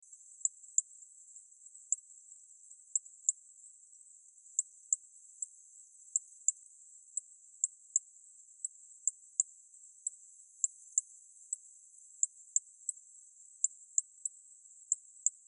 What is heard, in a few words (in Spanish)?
coqui llanero